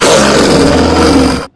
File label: antlion growl; hl2